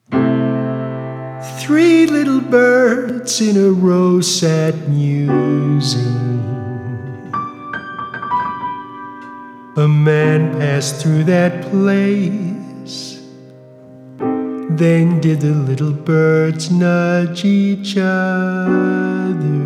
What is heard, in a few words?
Three little birds in a row solo version